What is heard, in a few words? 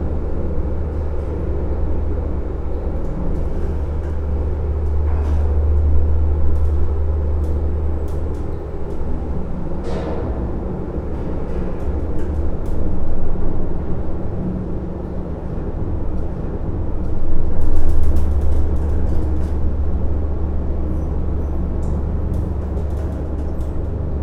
room amb loop; Ambient